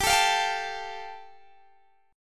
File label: G 7th Suspended 2nd, Guitar DADGAD